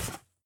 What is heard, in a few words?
Cardboard